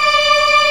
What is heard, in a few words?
Organ, Big Barton Pipes